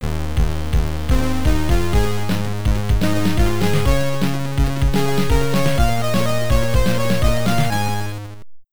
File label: Level Complete; Bionic Commando; amiga